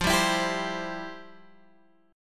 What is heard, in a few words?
F Major 7th Suspended 2nd, F, down, Guitar, Open G